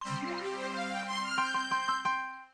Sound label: startup; HTC T-Mobile Dash WM6Beta